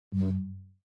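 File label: operating system Mac OS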